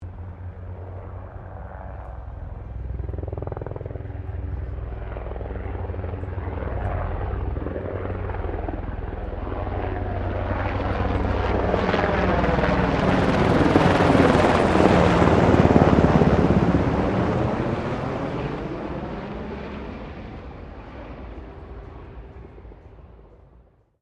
HELICOPTER 1990 SIKORSKY UH60 BLACKHAWK PASS; Helicopters; Vehicles; Aircraft Collection

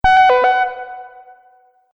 Multimedia Event Notification, beep sound effect

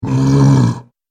Zombie Deathly; horror; Zomby Sound Effects

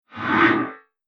Production Whoosh; Andrew Fleming

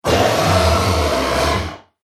Dinosaur Epic Lizard Monster

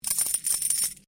Coin Penny Multiple Drop Hand; Common coins; Money; Objects

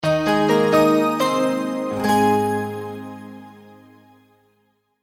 multimedia logo, Musical Identity/Signature

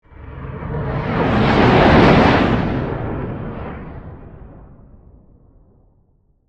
Spaceship Pass By, Fighter, Jet; Steampunk Sound Effects; BlastWave

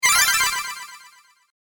Spellbound Sparkle, magic sound effect; fantasy sound effect; Andrew Fleming; Twistedtracks

